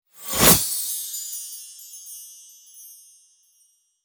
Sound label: Magical whoosh transitions christmas fast transition